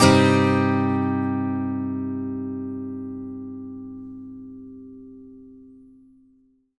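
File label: acoustic guitar C minor up, left; Country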